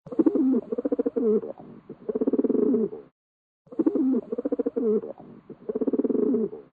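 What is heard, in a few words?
cooing of one pigeon close up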